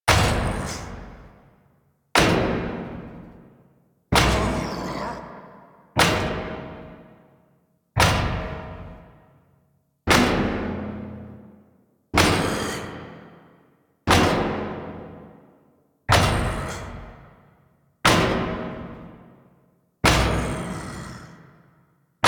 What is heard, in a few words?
Pipe Thumps; Entrati Labs; Cavia Rank Up Scenes